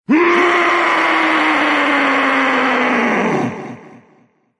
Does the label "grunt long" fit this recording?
Yes